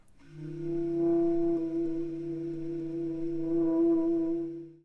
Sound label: multiphonics